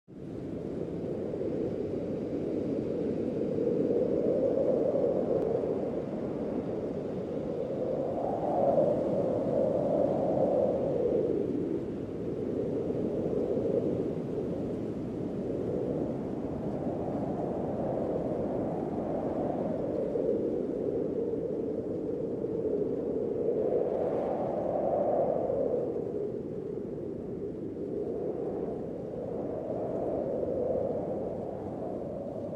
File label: Cold Wind Sound Effect Strong Winter Blizzard